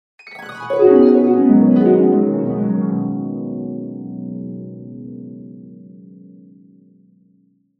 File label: Musical Flashback Dream Down; Cartoon & Infotainment; SmartSoundFX